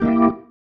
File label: Stab One Shots, Organ